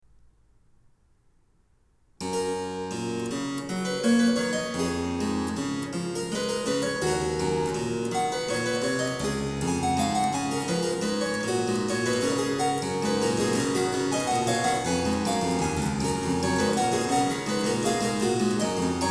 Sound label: Canone all'Unisuono, Harpsichord; Bach, J S; Goldberg Variations; BWV 988